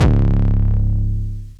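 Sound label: 808 distorted, C#1, kicks; Mbase 11 Kicks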